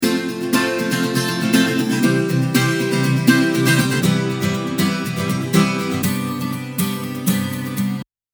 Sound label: VTRC Akku EQ